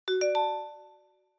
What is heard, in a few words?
notification